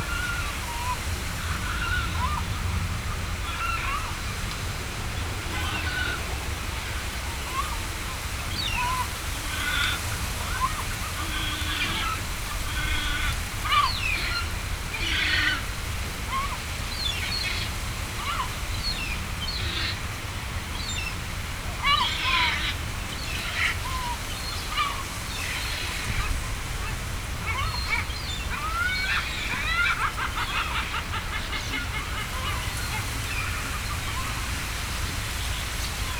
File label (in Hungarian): sárgalábú sirály halastavak; hortobágyi nemzeti park 2013